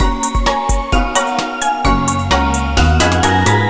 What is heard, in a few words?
upbeat; Toki Tori; forestfalls; Toki Tori Collection